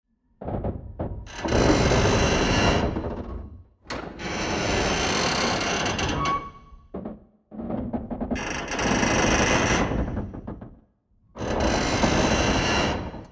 Prison Idle; Seer; GamigoNA

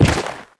Mad Slug die; Seer; GamigoNA

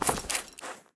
Cat Black Idle

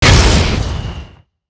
Mine Stun

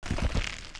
King Spider Walk; Seer; GamigoNA

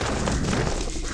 Slug run

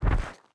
Mammoth Back

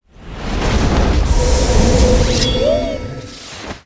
Metamorphosis

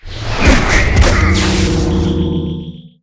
Rock Stun

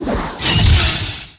Sever Bone; Qickskil

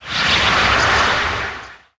Windy Raid; Qickskil

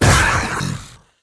Totem Die; Seer; GamigoNA

